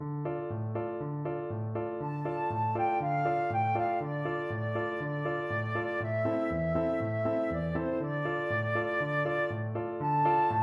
desert cowboy, flute

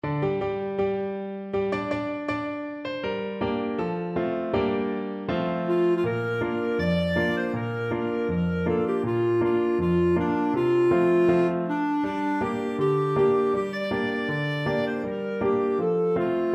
24th feb clarinet

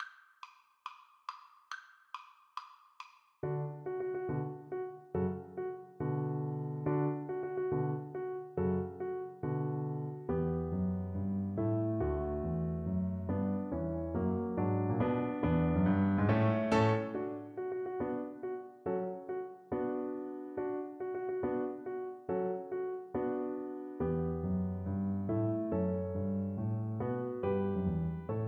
nutcracker, Bassoon

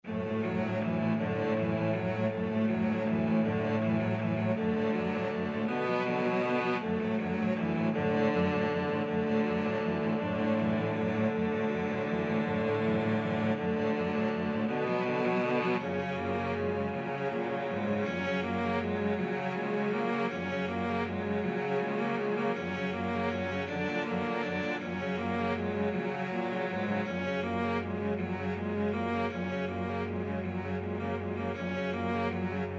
cello quartet; grieg morning

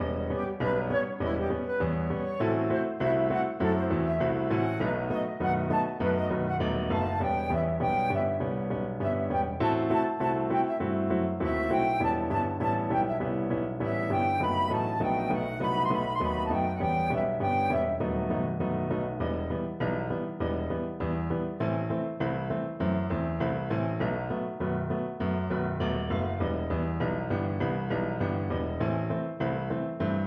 stomp flute

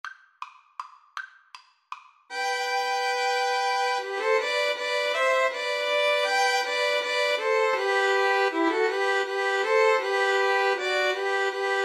slavonic trio, 2 Violins Cello; Dvorak, Antonin

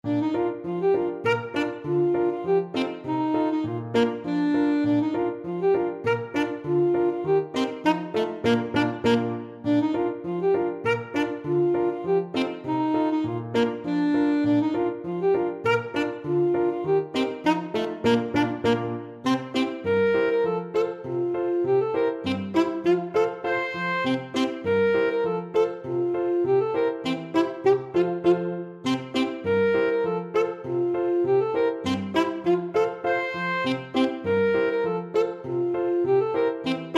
polka, saxophone

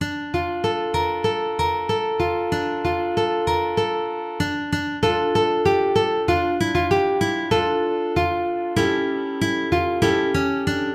Pat A Pan uke

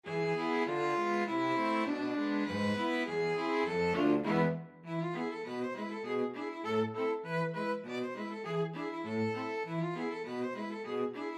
string quartet

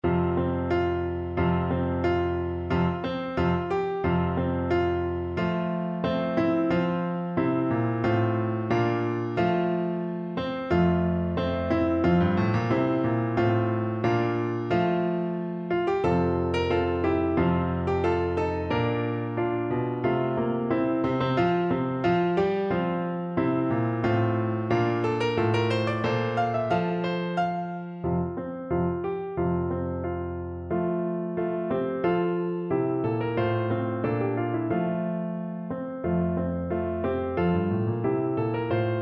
grenadiers, XYL; Percussion

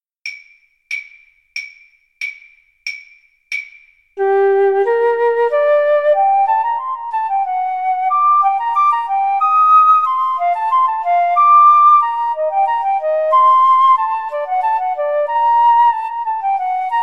rondeau fl cl, Flute Clarinet Duet

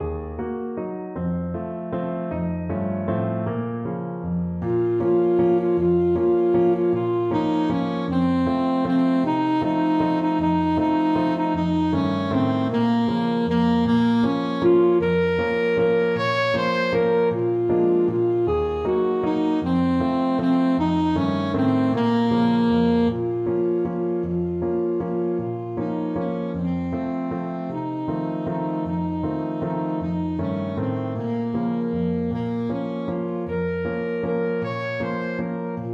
tumbalalaika, saxophone

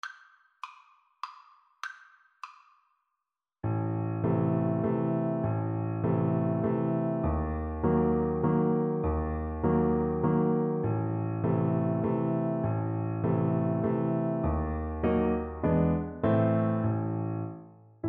diabolus FL kar, Flute

